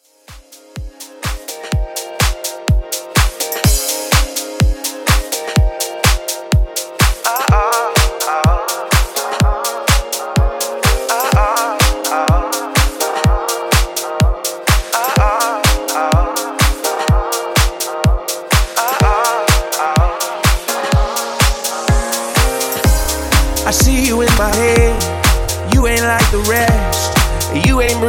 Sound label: 125 Bpm